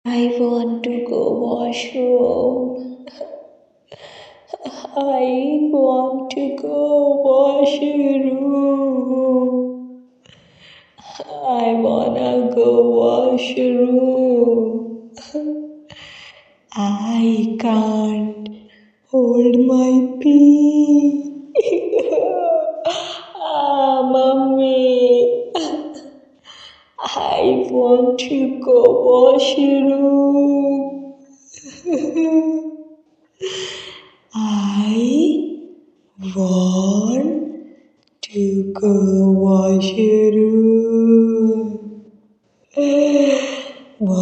creepy girl singing i wanna go washroom scary horror voice